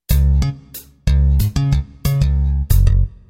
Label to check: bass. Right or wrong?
Right